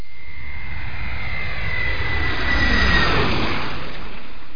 jet long

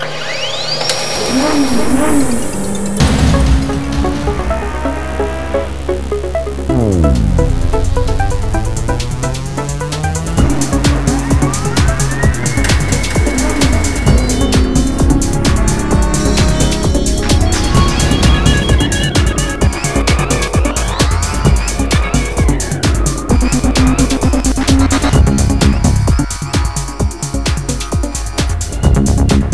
music

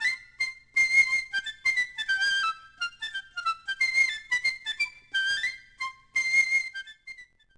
PICCOLO

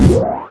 power jump; Stunt GP

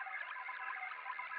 research; machines

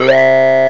talk box

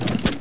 pump sm; landslor

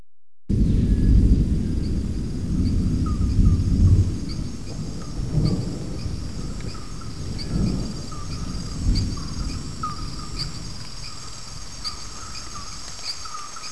Rain Forest Asterisk; 250 Desktop Themes

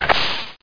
CONCUSS

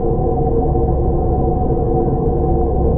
engine; Earth and Beyond; EA Games

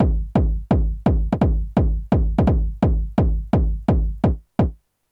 dr kick; Techno Maker SPCS

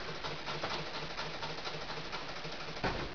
arcade; 12000 Graphics Pack - A Complete Graphics Collection For Your PC

